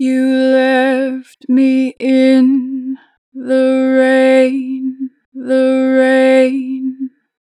Natural Vibrato, Vocals, 4 bar vib; Left; Harmor Vocals

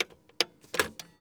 Gear Foley Reel to Reel Sequence; FX; Cymatics; Eternity Sample Pack

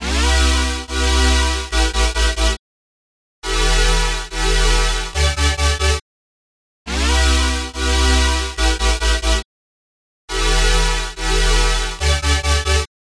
Supersaw Loop, 140 BPM E Min; Synths; Drop Loops; Future Bass

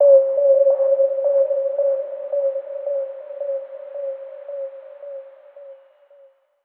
FX; Exterminate

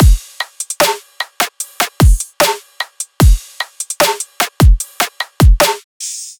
Drum Loop 150 Full; Ghosthack; Color Bass Essentials

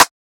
Rim, Drum Kit; Nose; Cymatics; GHOST (Beta Pack)

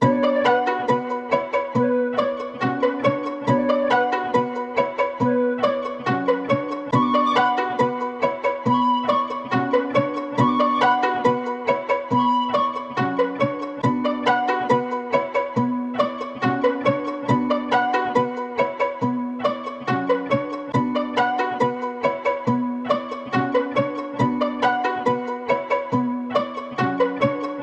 139 BPM B Min, Melody, Trap; Cymatics; 2022 Melody Collection; Trap Pt 2

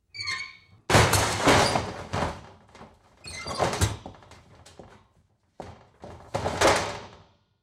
Metal Door Bang Squeak Rattle; Fairlight Sound Lib

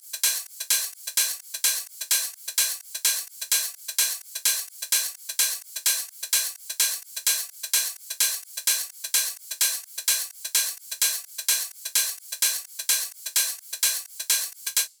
Top Loop - 128 BPM, Drum Loops; House; Hammerhead; Cymatics

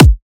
Kick, Drums

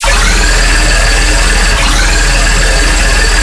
Bass Loop E 140BPM, Dubstep

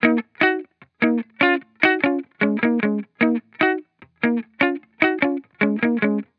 Hip Hop Guitar Loop - 150 BPM D# Maj; Cymatics; Vibrations Guitar Sample Pack

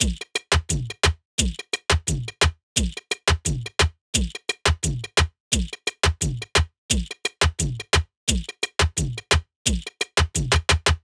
Percussion Loop 87 BPM; Wasted; Hip Hop

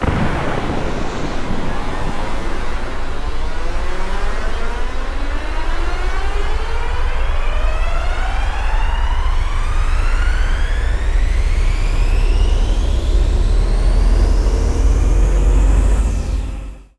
Booster Sounds FX; Cymatics; Video Game Sample Pack Vol 1; Galaxy Rush

